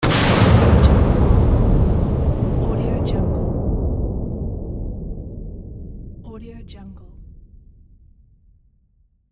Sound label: explosion impact